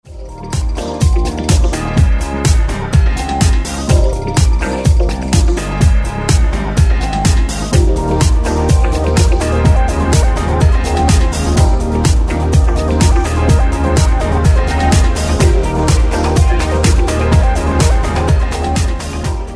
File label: Modern Pop